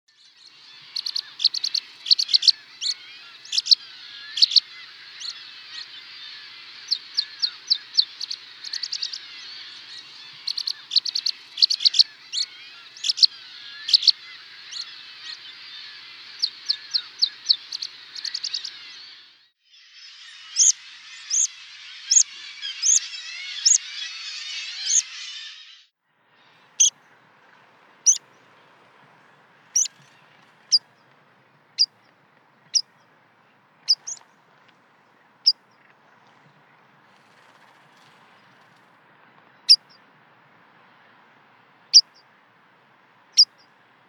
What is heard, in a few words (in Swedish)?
skarpiplarka, fagelljud